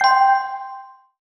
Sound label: Correct Letter